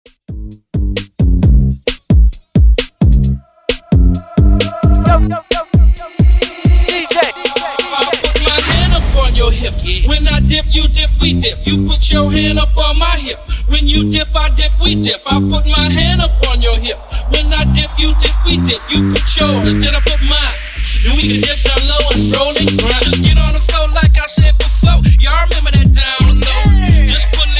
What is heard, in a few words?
Epic Intro